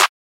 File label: Clap Layered; Drum One Shots